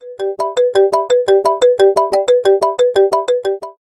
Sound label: Business Message Tone